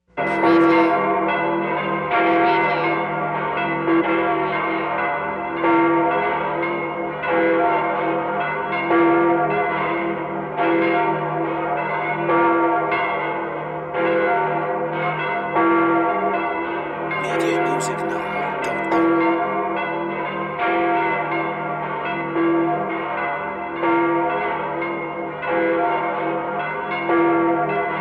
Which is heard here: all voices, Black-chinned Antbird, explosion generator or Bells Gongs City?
Bells Gongs City